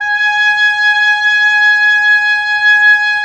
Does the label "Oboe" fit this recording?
Yes